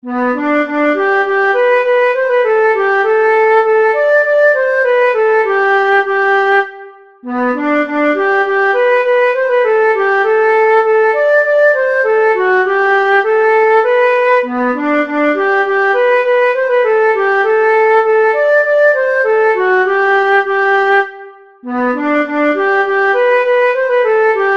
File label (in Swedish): mazurka; Goteborgsk